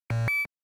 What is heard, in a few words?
Futuristic alarm descending tone single alert